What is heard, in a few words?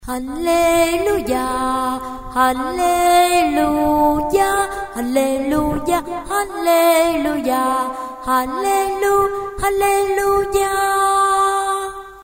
basso